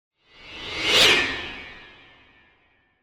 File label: Piano Jarring Piano Hit, High Tones - Passing; Hollywood Edge; sound designer's toolkit v2